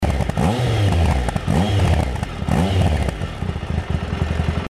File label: tiger, triumph